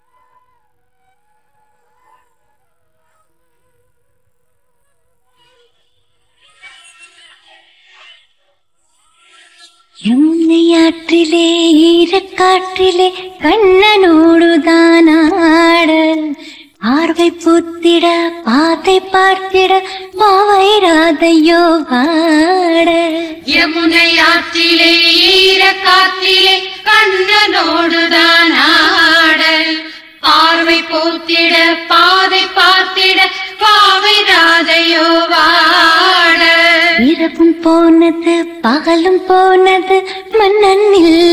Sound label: Vocals